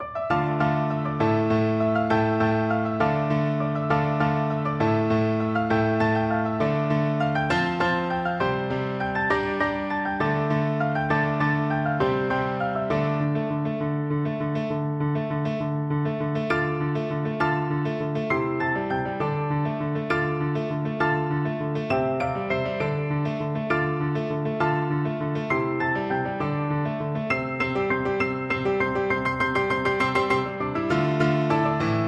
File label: pirates piano duet